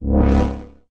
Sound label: Fog